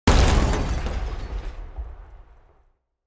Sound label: Big Crash